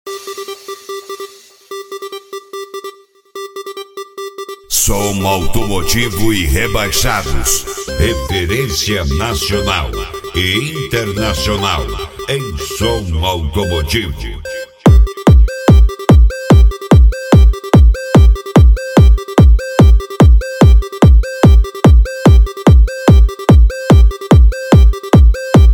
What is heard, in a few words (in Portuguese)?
som automotivo; rebaixados 2023